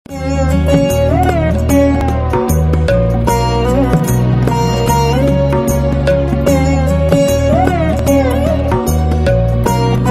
tamil ringtone

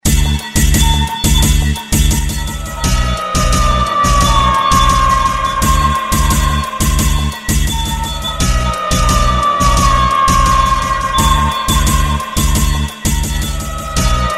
appeals ring ringtone

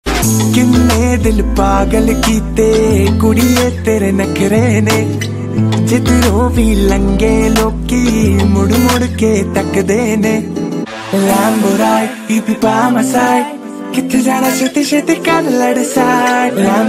punjabi ringtone

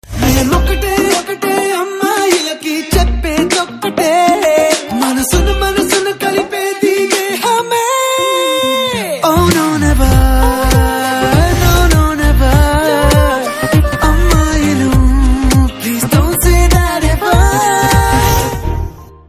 telugu tone

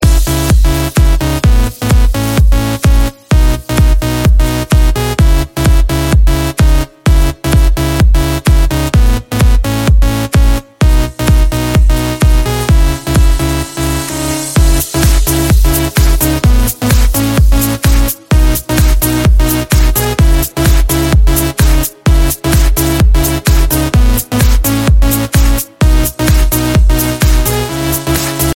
new electro ringtone

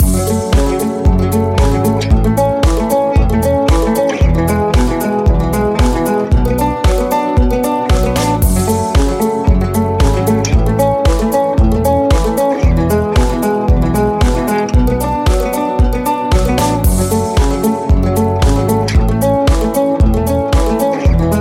ringtone

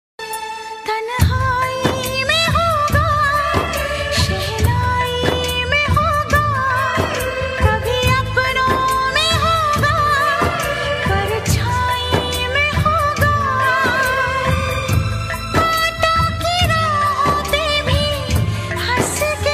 sad song ringtone

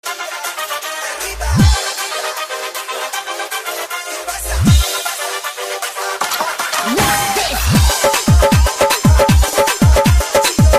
tamil ringtone

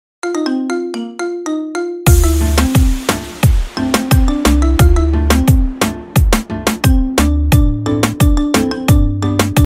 marimba cover ringtone

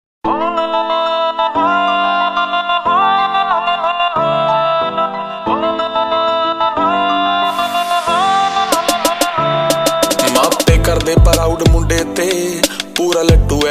punjabi ringtone

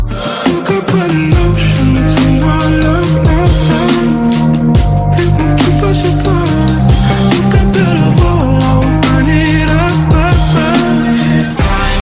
hot electro ringtone

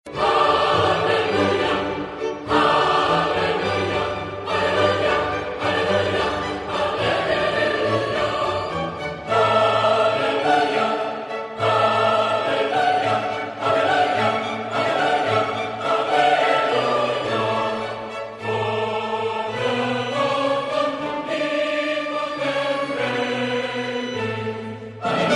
classical ringtone; handel

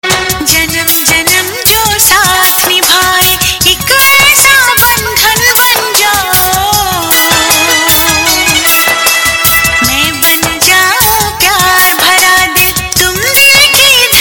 bollywood ringtone

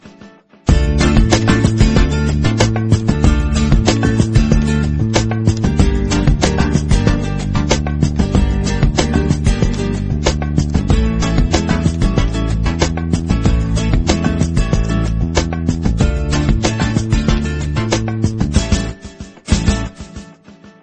mexican ringtone

